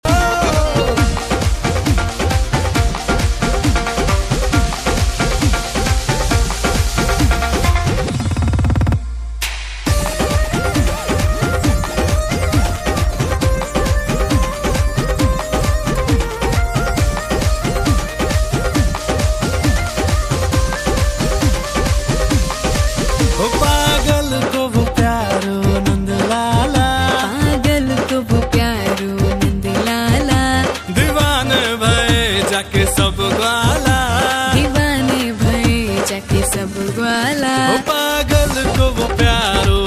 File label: Instrumental Janmashtami Special bhajan, Sankirtan